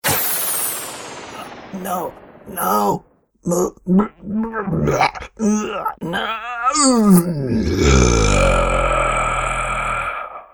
Man To Monster Transformer Spell, monster sound effect; Andrew Fleming